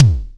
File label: BDRUM, Simmons SDS5